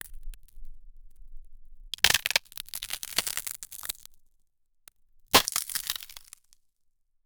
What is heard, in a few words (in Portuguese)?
gelo quebrando em cima de, glittering